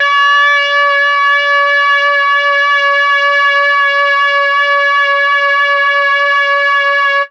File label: MEL BRASS; ILIO; Propeller Island; Legendary M400